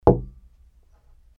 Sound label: ramen Donburi